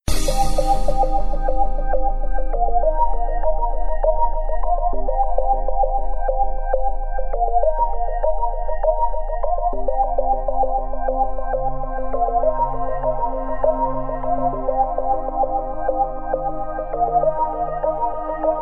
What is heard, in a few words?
Beat Trance